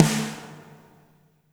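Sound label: reverb snare right; Spectrasonics; Burning Grooves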